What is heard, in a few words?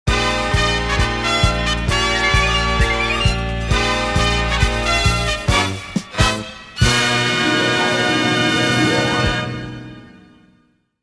daytime talk show intro fanfare